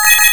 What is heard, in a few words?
Bell Com., Bell Com. call sound; AOL; Alert Beeps